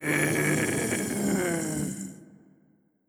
Mummy Death; Legend of Keepers

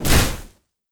Hit Air

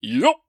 Yelp; Dung Def

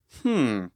Hmmm; Hollow Knight (gamerip) (2018)

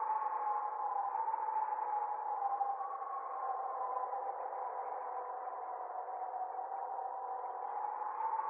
Wind Loop Gate; gamerip; Dirtmouth

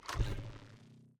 Purple Timer Turn On

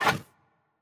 Wood Slide; Wood Slide Pic; Legend of Keepers

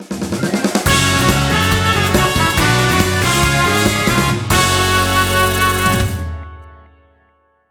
Jingle Start scenario

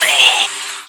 Moon God Hit; unsighted; enemies

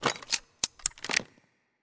Harpoon attack Gun Foley

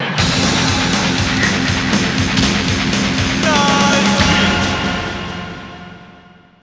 Str G#

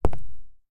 Footsteps Concrete Shoes; FX Oneshot Footsteps

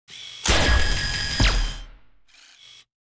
Incision

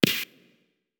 Projectile Burst; Grab Spitter

